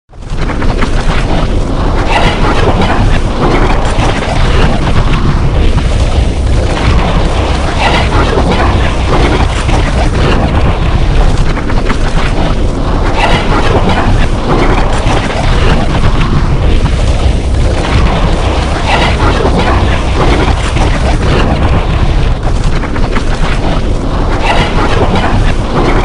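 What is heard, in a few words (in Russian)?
wormhole, space tunnel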